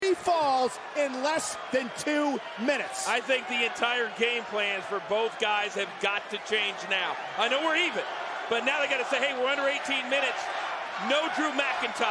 wheres the clock chant; seth rollins dolph ziggler iron man

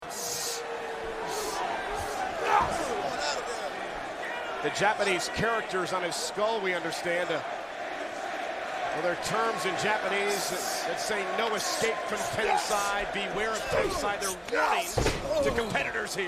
chants; A-Train

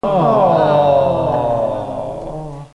awww

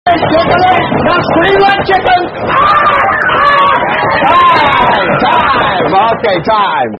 scream like a chicken; Roddy; clucking and stuff; fighting fit

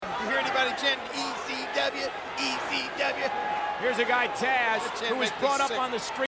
chants; jerry; ecw; triple h tazz

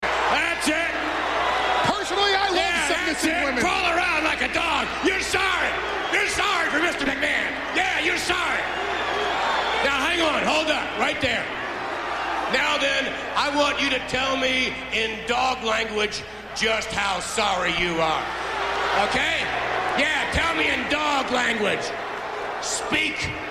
barking; trish

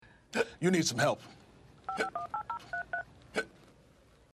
touch tone

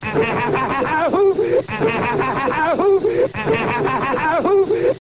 reindeer laugh; santa claus

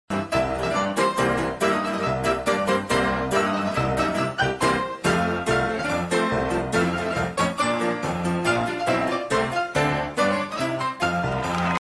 intro music; donnybrook

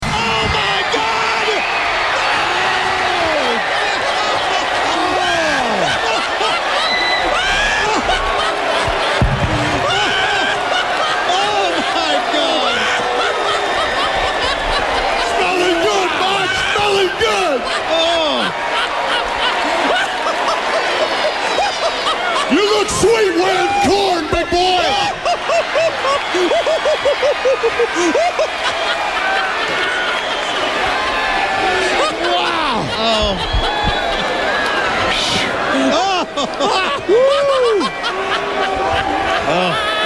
howling announcers

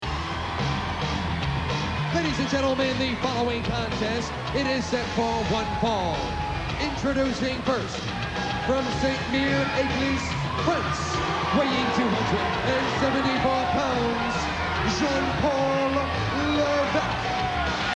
music; jean paul

